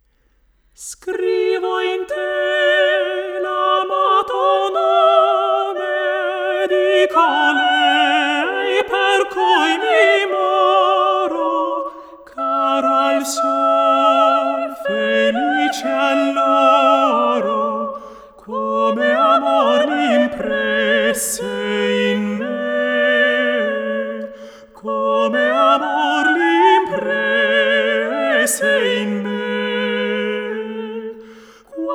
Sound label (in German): Mehrstimmige italienische Gesange; ''Scrivo in te''; Brilliant Classics; Der Glorreiche Augenblick Op.136